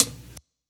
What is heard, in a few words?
Hi Hat